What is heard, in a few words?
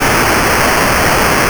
television